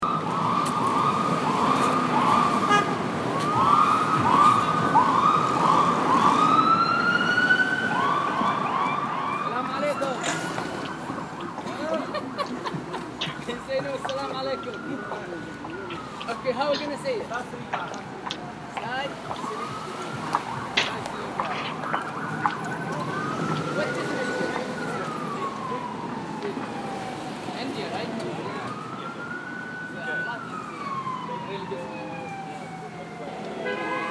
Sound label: New York City Sounds